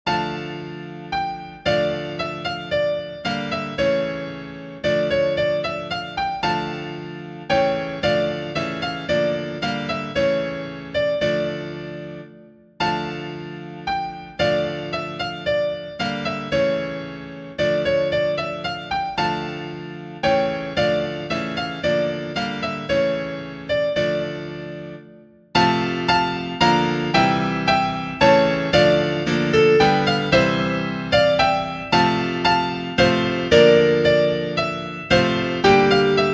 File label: Minuet in D Minor; Visée, R. de; Barocco